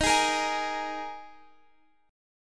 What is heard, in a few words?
D sharp Suspended 4th, Guitar, Open F; x,x,15,15,13,15; strummed